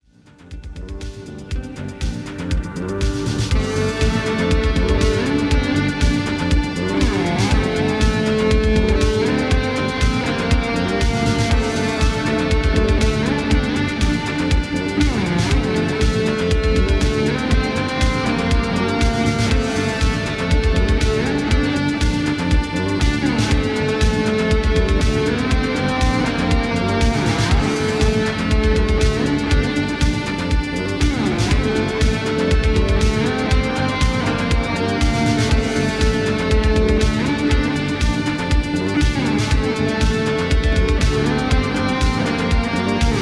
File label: bgm; diogenes gaming mines